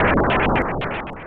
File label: Thunder damage; mother2